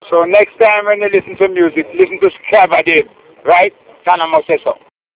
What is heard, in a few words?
jingle